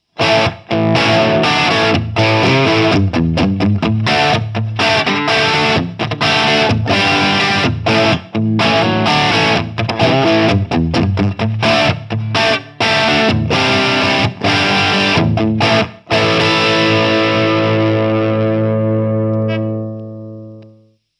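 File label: Humbucker No Sagging, guitar; HughesKettner StompMan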